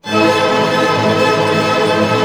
ARP D# MIN FF, STR ARP; Sound On Sound; Peter Siedleczek's Orchestra

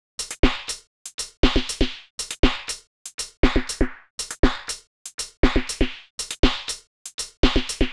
120 trance beat, Drum & Perc Loops

